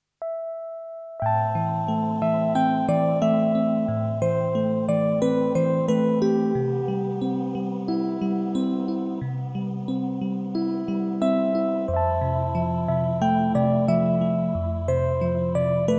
Mechanic Techno (Menu); Lord Monarch First

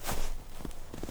Snow Footstep; blastmorph pinball; xbox series xs

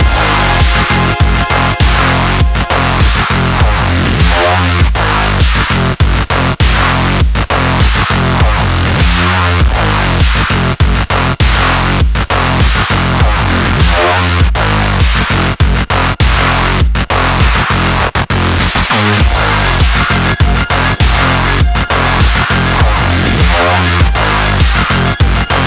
boss level loop; Black Paradox

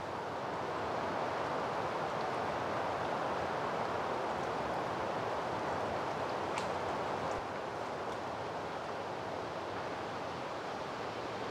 Ambience Windy

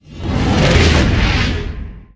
pass by double truck; talking tom gold run gamerip 2016; Spaceworld

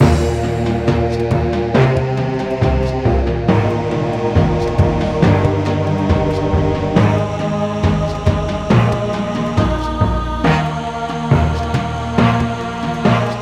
Cinematic Monk; Hunter's Legacy Purrfect Edition

